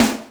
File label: snaredrum, Yamaha RM 50